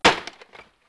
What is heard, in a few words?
mag draw, weapons; FY71; cstrike